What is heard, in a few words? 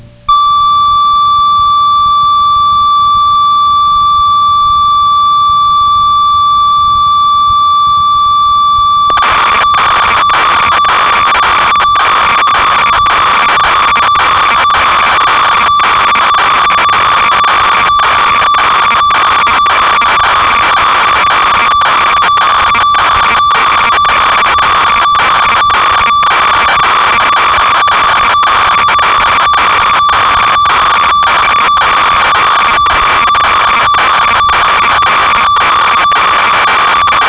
ob6, synth